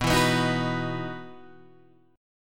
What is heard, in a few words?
E Major 7th Suspended 2nd